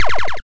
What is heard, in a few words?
laser shoot; sky knight